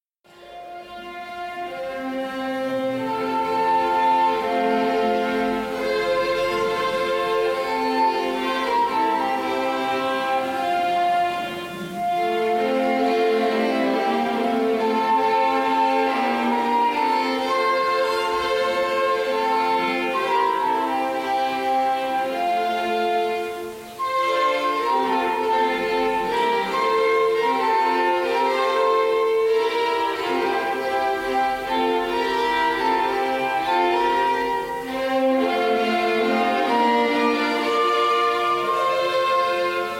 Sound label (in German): Instrumental Quartett; 2013 adventskonzert